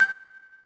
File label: KEYBOARD INPUT; Pump It Up Exceed